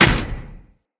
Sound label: Moa Step Default, Footsteps